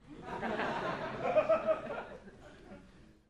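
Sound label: Crowd 100 Short Light Burst; medium int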